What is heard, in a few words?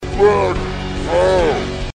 backup slow